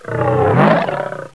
Hound

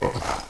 Ogre

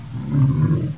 Hunter

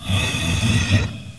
Dark Knight

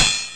Repair

